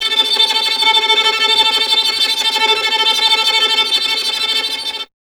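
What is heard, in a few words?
string violin, Vln Trem; Miroslav Vitous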